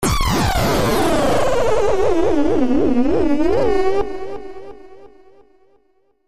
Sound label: Alien Sweep